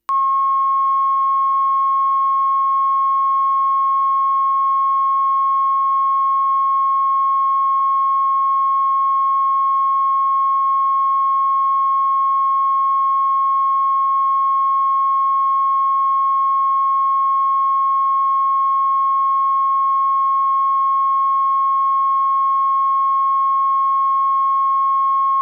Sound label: tone, chorale